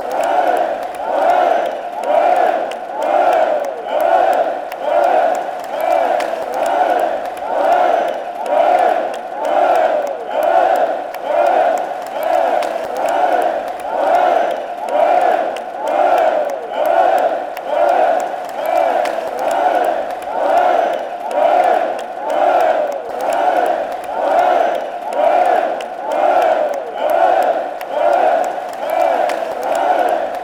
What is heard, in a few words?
ale ale ale, fan chants; athletic